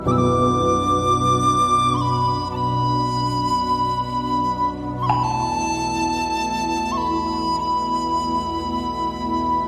flute sms

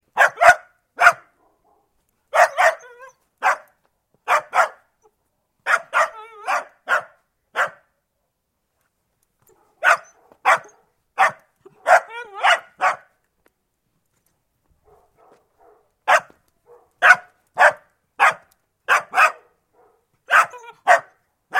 dog barking sound dachshund

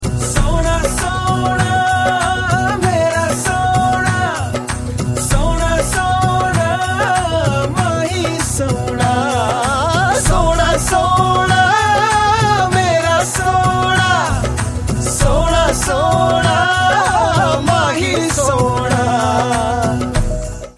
wedding qawwali

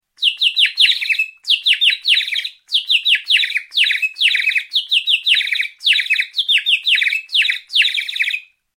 bird whistle

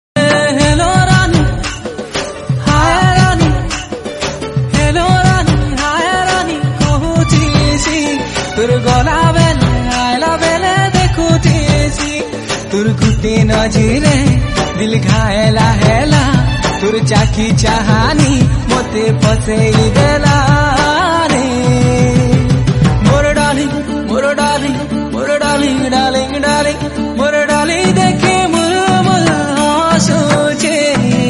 Sambalpuri Song

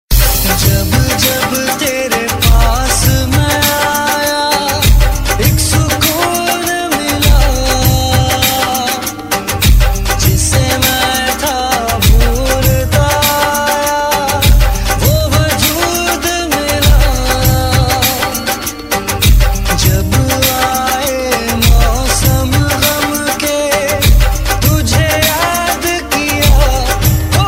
dj garba

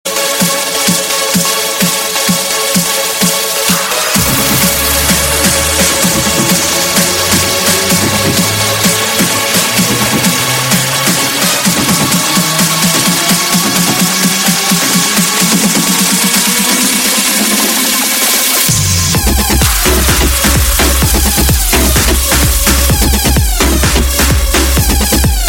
chaotic mix, ringtone

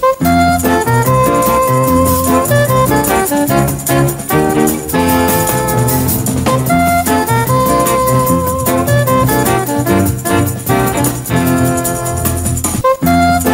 Danish xmas calender

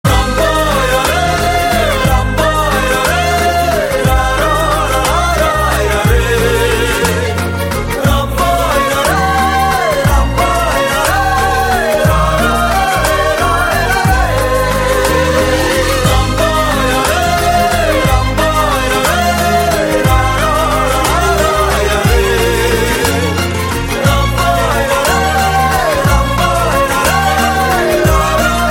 ringtone

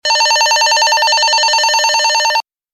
cellphone ring

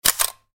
camera shutter sound effect

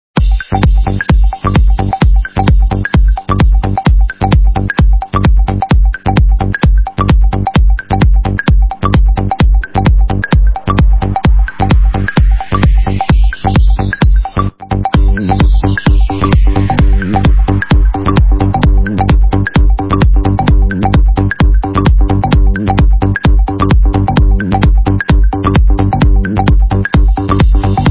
arabian night original club music mix

